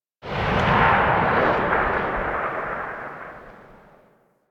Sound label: Tail; Warframe; Weapons; Thanotech; Thanotech Rifle; Trumna Prime